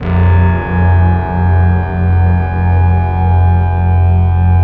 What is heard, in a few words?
BASS, Drum & Bass 160 BPM; Ueberschall; Drum & Bass Flash Trackz